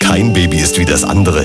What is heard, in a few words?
baby